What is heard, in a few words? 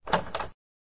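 clear button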